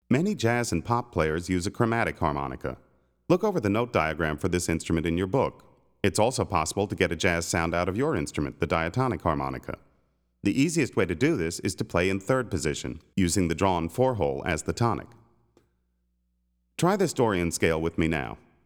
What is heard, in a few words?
Jazz and Pop Harmonica; Play Harmonica